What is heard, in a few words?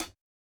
hihat, house